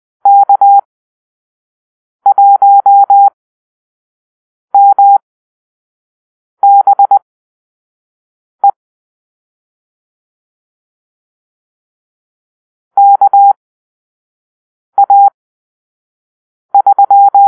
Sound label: Call-Sign Drill, morsecode